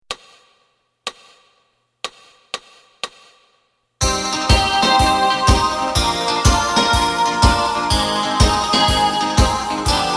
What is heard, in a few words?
bass